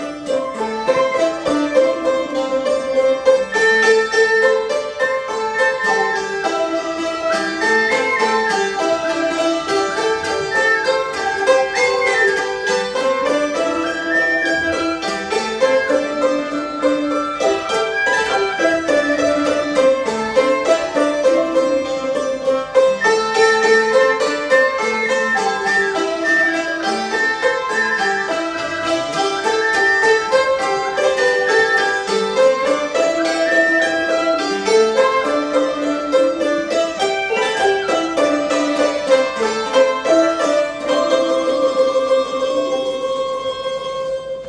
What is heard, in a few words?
traditional cambodian music